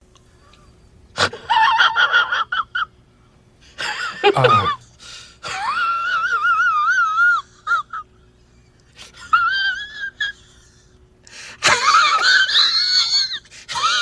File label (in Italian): risata stridula amplificata